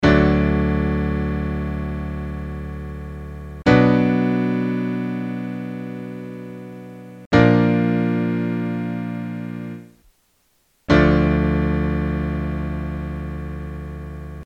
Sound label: Four Chords, guitar